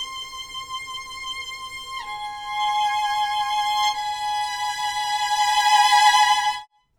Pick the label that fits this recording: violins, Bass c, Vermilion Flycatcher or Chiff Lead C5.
violins